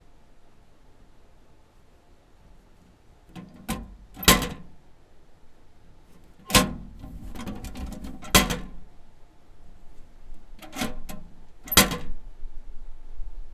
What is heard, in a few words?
Mailbox